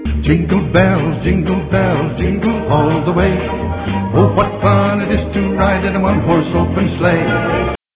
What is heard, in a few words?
jingle bells